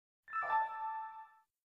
windows 7 sound effect

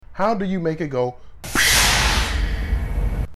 bus pshhht

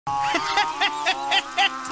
laugh; king dedede

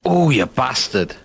bongo

